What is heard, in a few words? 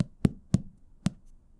Softcover Taps; bb - Books, Paper, Writing